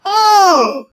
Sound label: uh-oh